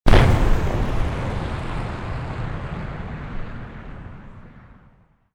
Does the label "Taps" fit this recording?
No